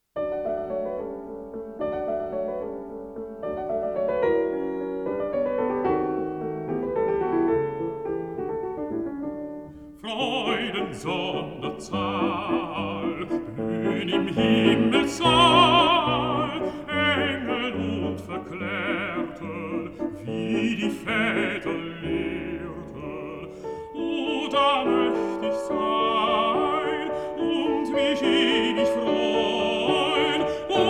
song for voice & piano

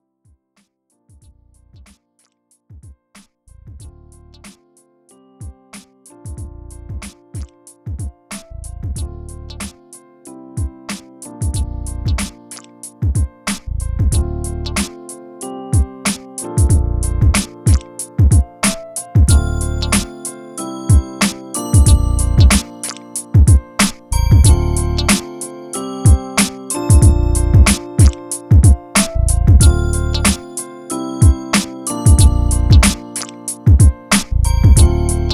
(Instrumental)

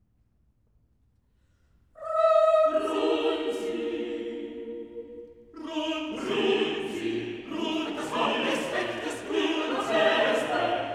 Songs for chorus (vocal sextet); Nachtwache II; Brahms

